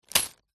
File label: industrial small plastic bag of metal screws drop or set down; zapsplat